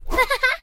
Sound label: checkpoint